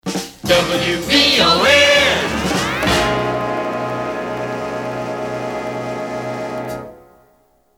Time Chime; WVON; (first half of logo)

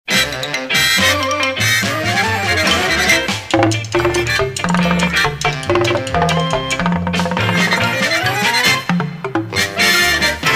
Boo Wacky; WJSO

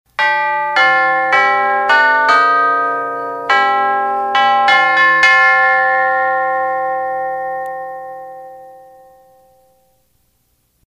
Grand Chimes; PAMS; KQWB

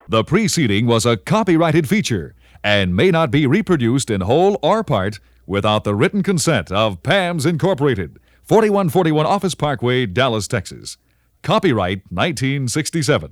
Copyright; WABC

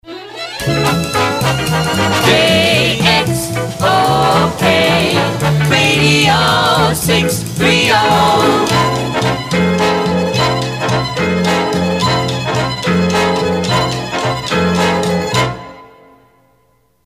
Record Recall; KXOK; PAMS

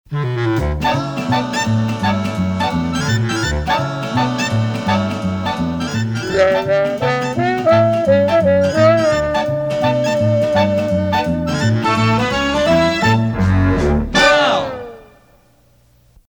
Wow Sig; PAMS; KISN

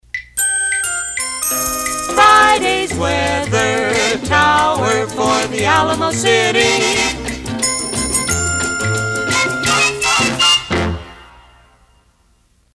Weathermation Friday; KONO; PAMS

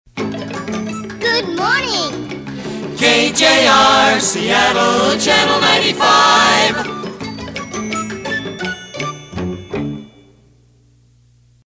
Short Sig; KJR

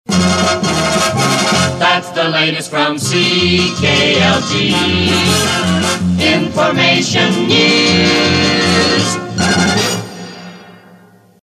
News Outro; CKLG

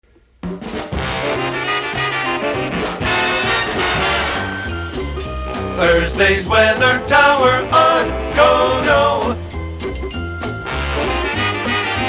Weathermation Thursday; KONO; PAMS

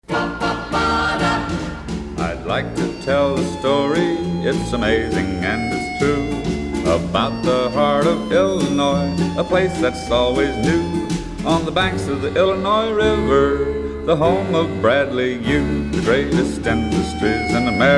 Theme Montage In Brass; Pre-Record (Not Customized for station); WXEL; Peoria

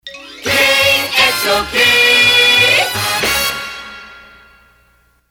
Trumpet Signature, instrumental; KXOK

